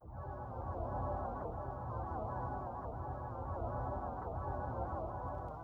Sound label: The Violence District D - Ambient